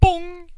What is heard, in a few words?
pong